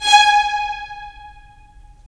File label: Violins; Roland - LA Composer vol. 4; Violins Sh